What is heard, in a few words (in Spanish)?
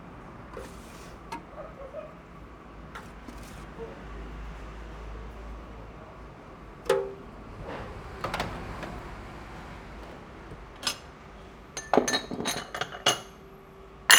AMB INT BAR UY Maquina cafe; Los Yuyos